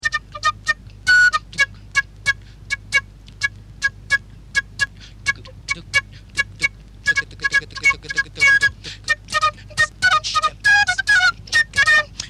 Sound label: Flute